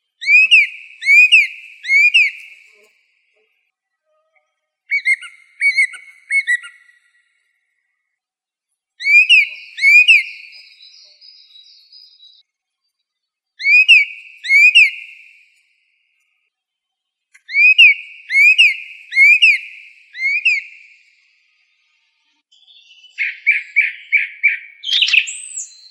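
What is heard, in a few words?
pevchiy drozd golos, song thrush voice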